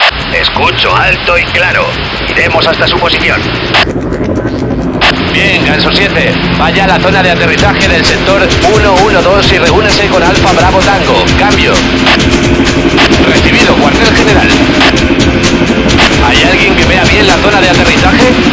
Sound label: escape music; ze subways